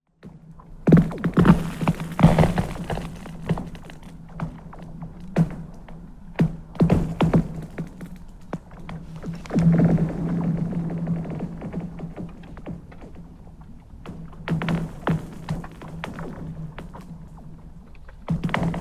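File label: hit on ice and crack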